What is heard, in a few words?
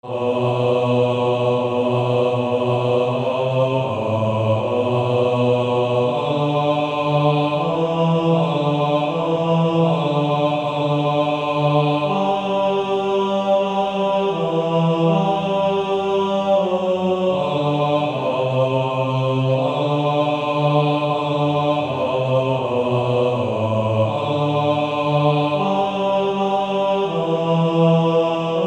Male Choir